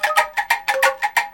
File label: ANGKLUNGS; Spectrasonics - Heart of Asia CD2